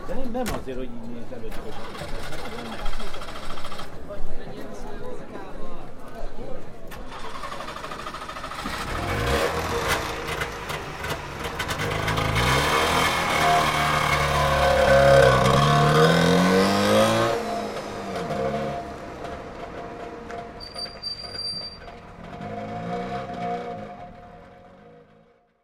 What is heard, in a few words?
A Trabant Starting Up